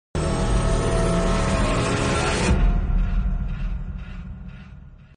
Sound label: Man crushed by elevator